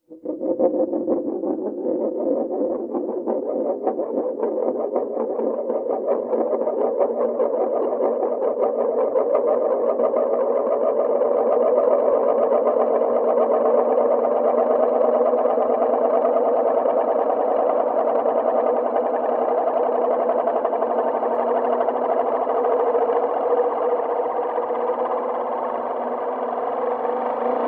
Single-Pitched High Frequency Spinning Tone Like An Obj; Electronic Sci-fi